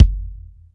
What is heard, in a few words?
BD, Bassdrums, Roland TD-20